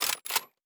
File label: Weapon Reload; Sci-Fi Sounds